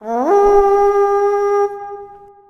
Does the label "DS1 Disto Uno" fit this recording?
No